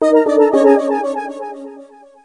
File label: Jingle, You Lose; rayman 2